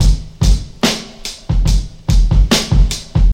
72 bpm drum loop f sharp key, kick tuned to the F# note. Loudest frequency: 979Hz